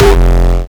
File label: kick oneshot monster F; GhostHack